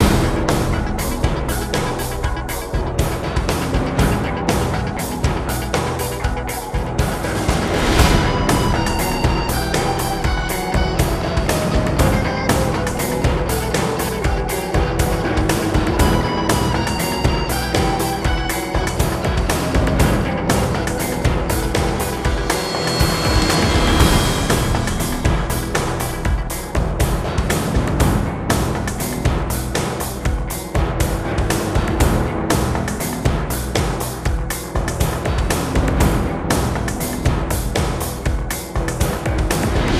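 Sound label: music drums guitar loop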